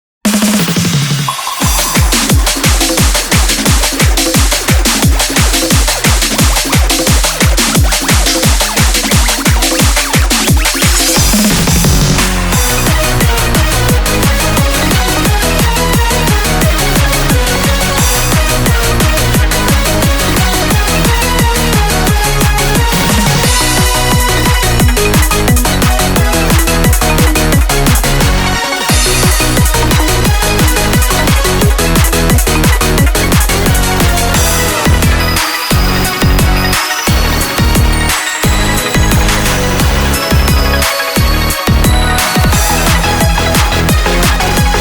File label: instrumental